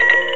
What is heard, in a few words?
join complete